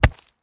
Concrete Chunk Drop; rocks & dirt

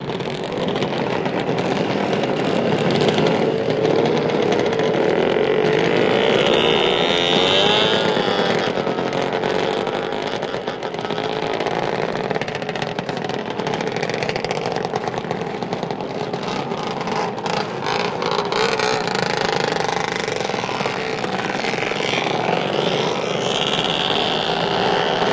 Snowmobile Race, Engines Revving And Movement

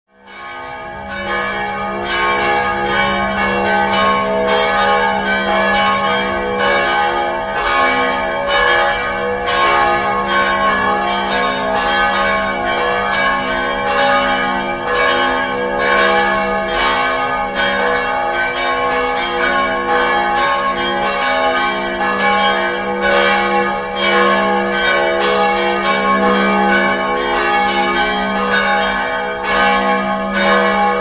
Monastery Bells Ring, bell towers

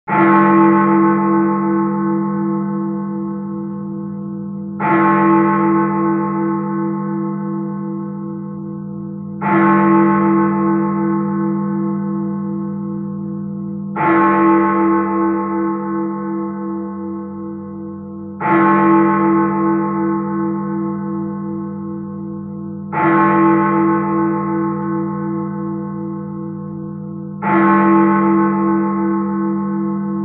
Bells Big Ben, bell towers

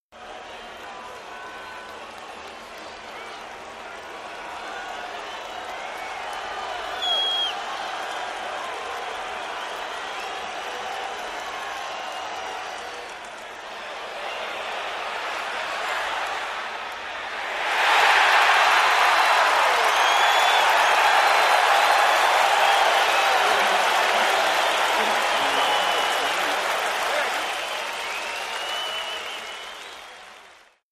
Football Crowd Stea, sports arena football

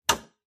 hammer hit

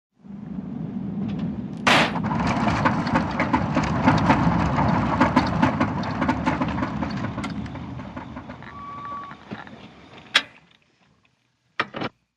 Auto Blow Out Punctre, blowouts

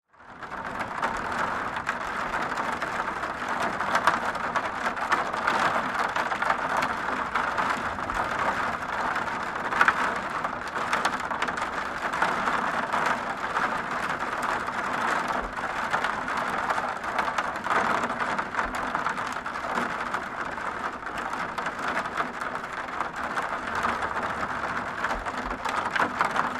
Rain Fall On Window Sil, rainfall

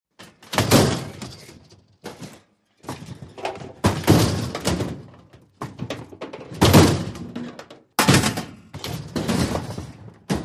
Junk Clatter metalli, crashes

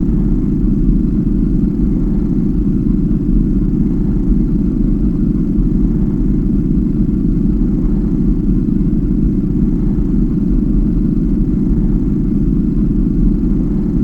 Starship Pulse Low

